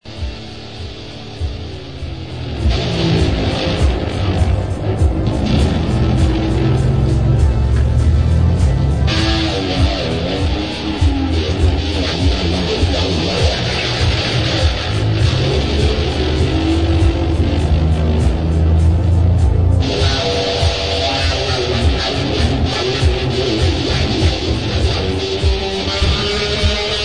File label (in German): gitarre